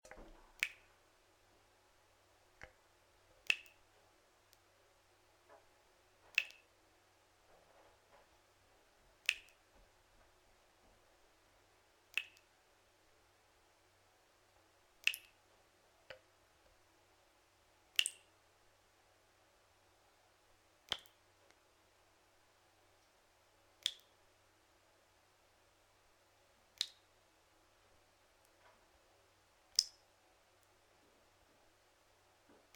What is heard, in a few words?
faucet loop; OneShot Fading Memory